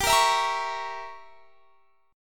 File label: G Diminished 7th